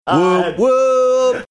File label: whoop whoop